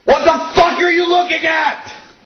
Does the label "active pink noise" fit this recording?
No